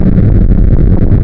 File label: ambient roof top war; hl2